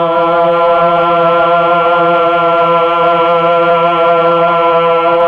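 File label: VOX Tron Choir F, Mellotron Slow Combo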